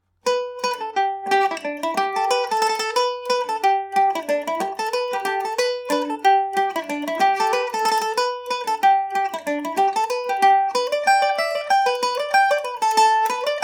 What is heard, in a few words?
md304, Irish mandolin; primrose lass